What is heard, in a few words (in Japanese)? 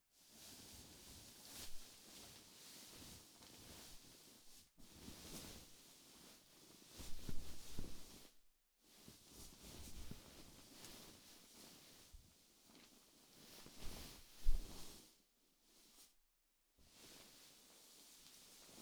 布団 を もぞもぞ; 3DIO; 布団の音